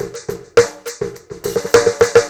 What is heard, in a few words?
Crunchy 104, Beat Loops; Zero-G; Monster Beats